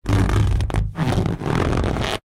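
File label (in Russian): быстрый омерзительный неприятный скрип резинового шарика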